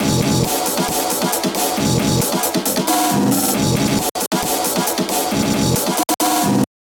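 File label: drum beat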